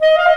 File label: THE CLARINET